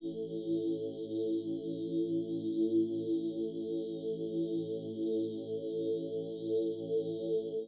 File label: synth pad, ethno, 125, A; eJay; Sphere